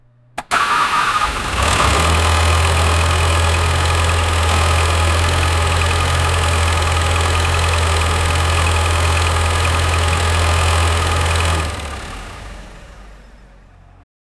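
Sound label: engine motor acceleration; ferrari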